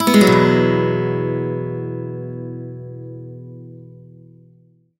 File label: E upstroke, Acoustic Guitar; Sonic Foundry (Sony Creative Software); Songwriter Acoustic Guitar Companion; One Shot Chords